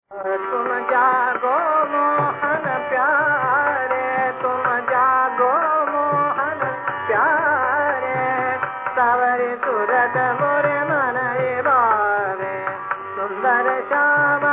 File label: bhairav